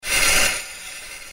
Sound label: enter, movement sfx; Jet Set Radio movement sfx